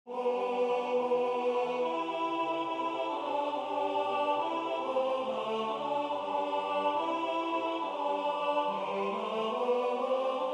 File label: tenor; Christmas Oratorio 59; Waiheke Island Choral Society